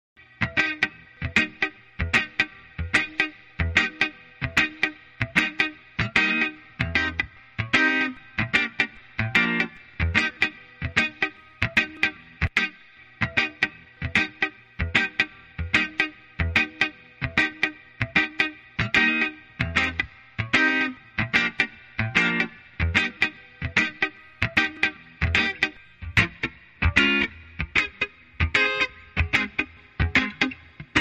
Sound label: lead guitar